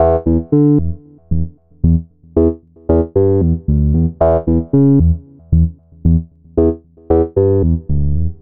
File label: Synth Bass; Sonic Foundry; Leo Cavallo Bass Taster